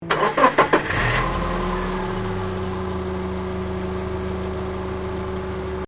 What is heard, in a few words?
Car Subaru Liberty Exterior Ignition; Vehicles; Subaru Liberty 20 01; Family members Subaru